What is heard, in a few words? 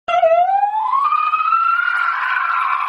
confused screaming